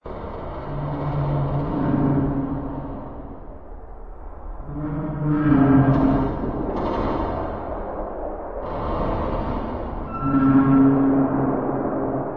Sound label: cargo ship; Atmospherics